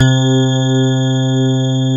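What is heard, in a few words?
grand piano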